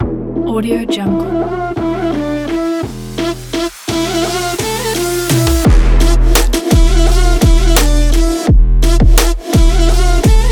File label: trap sport modern edm